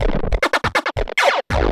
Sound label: Industrial 140